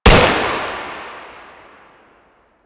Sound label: Shot Full Auto, Pistol; Weapons; Grineer; Amphis